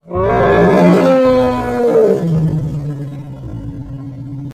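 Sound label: crocodile roar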